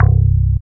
BAS, Eurotech; Sounds Good

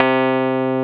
Keyboards Old School, C2; USB Soundscan vol.09; AKAI

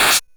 Drums, Hits; DCM8

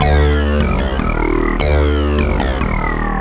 Bass in Wholetone, Synth Bass Loops; Sonic Foundry (Sony Creative Software); Groove Elements

